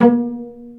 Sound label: pizzi total, stringed; East West; Global Sounds